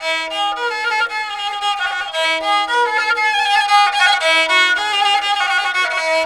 Chinese, Stringed Instruments; Sonic Foundry (Sony Creative Software); World Pop

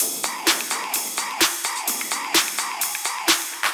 Loops 128BPM, Drumloops

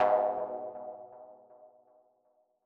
Mono DBeat G#2; KG MegaSaur

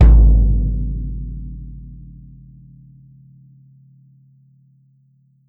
world drums, mixed drums; World Instruments

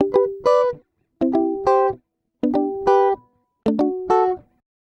Acoustic Guitar 99 bpm G, African Guitars

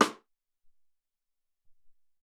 Heavy Rim, Alesis SR-18 Drum Machines; 300 Drum Machines

